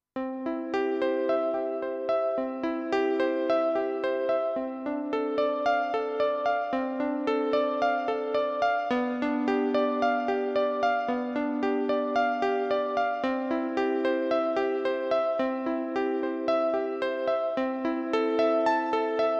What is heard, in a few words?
Electric Gran Piano